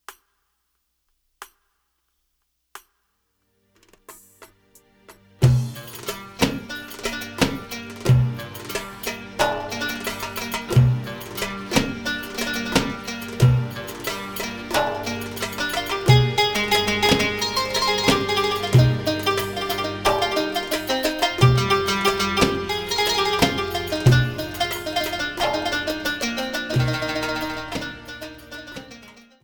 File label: Wahda Kabir Music, bellydance music